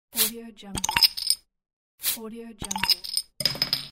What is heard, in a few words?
opening beer bottle